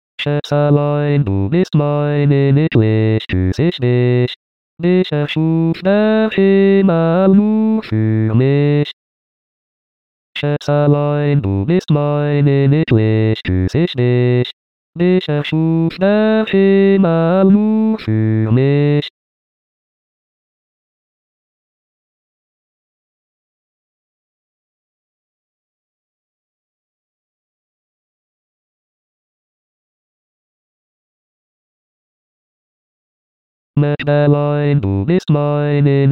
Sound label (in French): basse